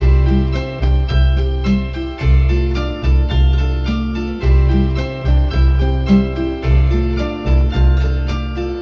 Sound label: guitar and keys, Phonky Loops